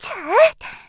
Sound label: attack; Eternal Return; Tia; Voice Line; KOR